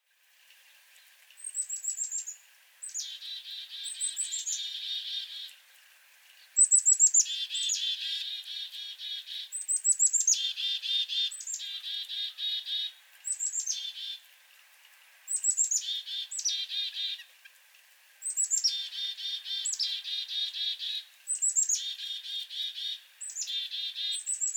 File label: Black-capped Chickadee dee-dee-dee calls